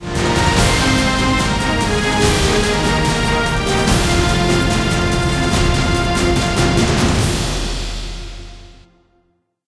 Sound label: round win; prison